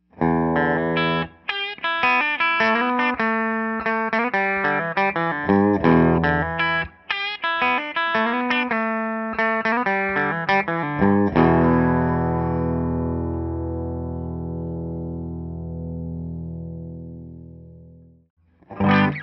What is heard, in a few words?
bridge clean